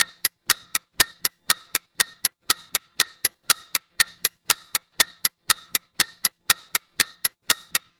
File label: mechanical clock tick